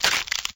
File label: neck snap; scp 173